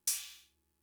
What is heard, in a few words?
Cymbal; Fricke MFB-522